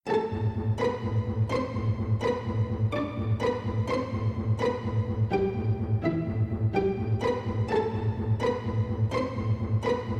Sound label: cross-examination (orchestra)